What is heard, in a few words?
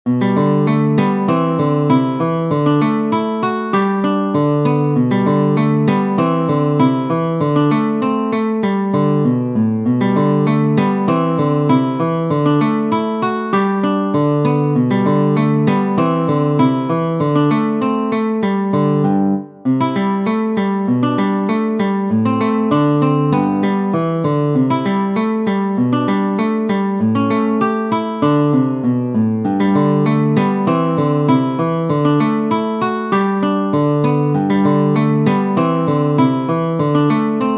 country dance, guitar